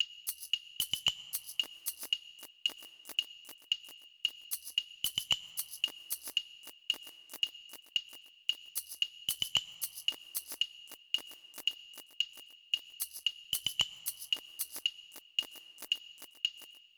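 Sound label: Ghetto Percussion 113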